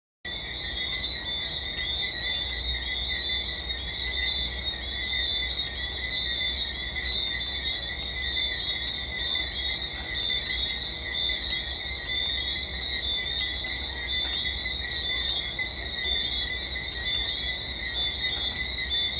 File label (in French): Grenouilles Martinique